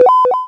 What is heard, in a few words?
Sound Start Level; arcade-canvas; ninja-runner